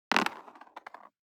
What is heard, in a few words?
DICE on Cardboard, Throw and Roll, Standard, 4 Four Dice; Samples; ArticulatedSounds; DICE Sfx Party (Extended)